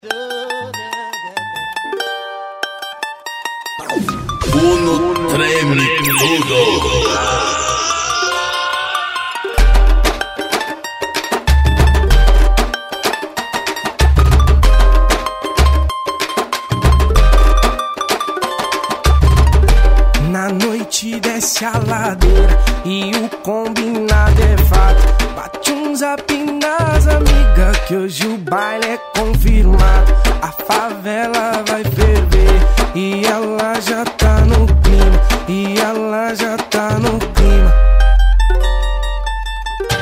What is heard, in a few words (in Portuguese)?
especial funk